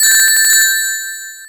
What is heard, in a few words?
loose scoins; sonic the hedgehog 3 knuckles